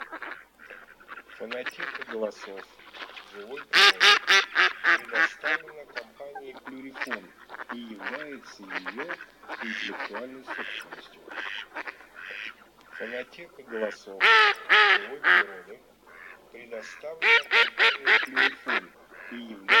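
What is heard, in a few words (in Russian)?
Кряква Germano, манок; plurifon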